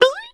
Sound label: double jump; Scrat